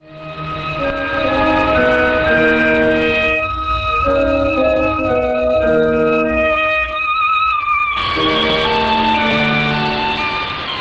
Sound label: music